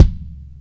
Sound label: Kicks